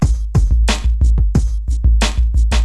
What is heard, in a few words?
Drum loop, HipHop; LiveAct Pool 1